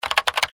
Teclas; Do Not Feed the Monkeys

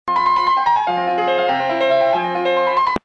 Call Center Musica Espera

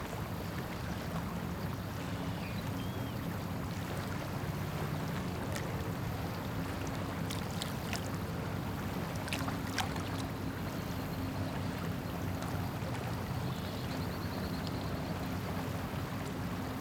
Ambiente lago; Yesterday Origins

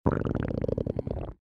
Tripas

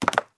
CAER TACONES MADERA

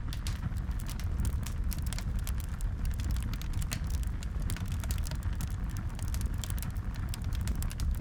ANTORCHA, torch

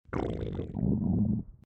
Tripas

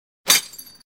Cristal cloroformo

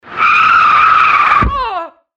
Crash Atropello; Do Not Feed the Monkeys

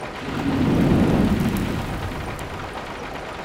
FUELLE ENORME

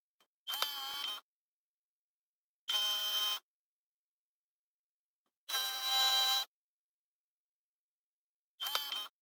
CAMARA VIGILANCIA

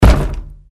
romper Puerta

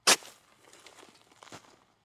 CAVAR HAZADA

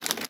ABRIR VIEWPORT

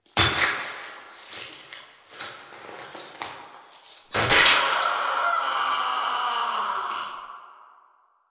Escena tortura

